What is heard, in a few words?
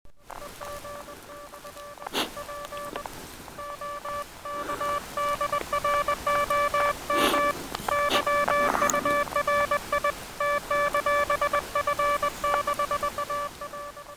DSB TRX